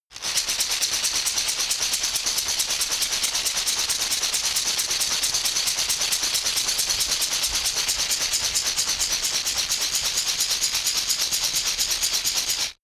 shaker wood rough rattle slow hard

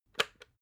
water boiler switch on

